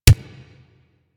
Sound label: Pistol Punch Initial, weapons; Warframe; Thanotech